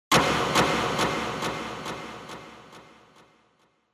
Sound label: Spotlight